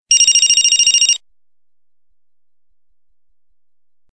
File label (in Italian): basso, suonerie